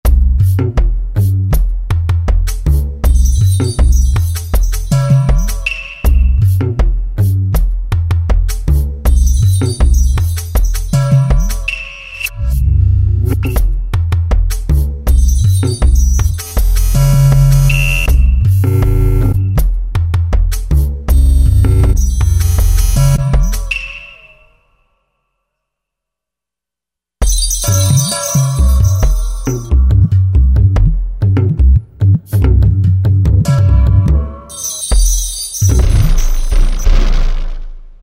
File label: Ethnic KIT, Roland V-Synth; Factory Patches